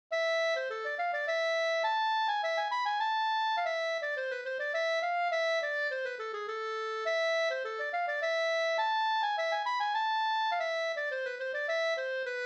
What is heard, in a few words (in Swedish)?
polska, Amoll